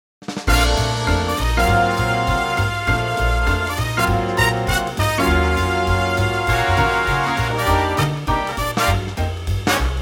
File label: Bb Big Band; key - Bb - vocal range - F to A; Fabulously fresh swinging Big Band arrangement of the classic torch ballad.